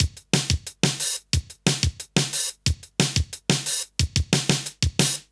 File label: Good Beat, Drum & Bass, Beats & Breaks; Fluid Dynamics